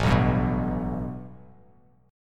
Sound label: A 7th Suspended 2nd; A7sus2 strummed; JGuitar